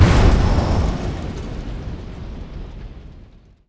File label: boom; PC Gamer IT CD 47; Centauri; alien-x-fire-demo